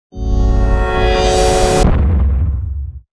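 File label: Cave Music, Dark Swell F sharp minor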